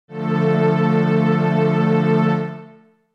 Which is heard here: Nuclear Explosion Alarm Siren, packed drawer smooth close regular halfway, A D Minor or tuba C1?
A D Minor